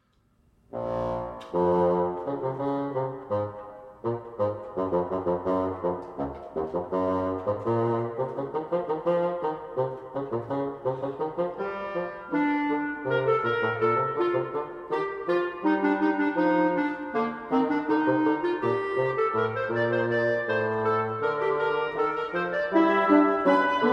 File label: Wind Quintet, Double Fugue; Suite Madera III.